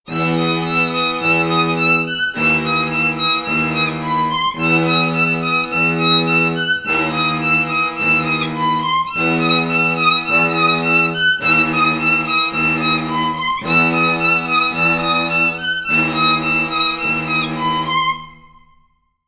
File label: TITLE-COIN; NEShooting; retro game music; classical